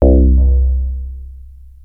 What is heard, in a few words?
Access Virus B; Synthworx